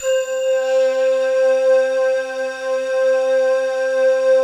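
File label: glockenspiel flute, C4; Best Service; Advanced Media Trax Modern Composer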